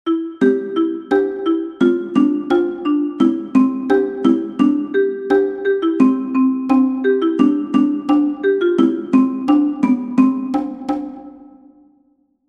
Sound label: Cajon; Ein Vogel wollte Hochzeit machen